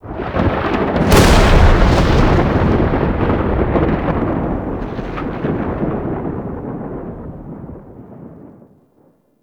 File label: Sky Thunder Clap; Ambience; Warframe; Duviri; Murmur